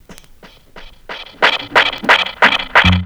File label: ECHO; USB Soundscan vol.27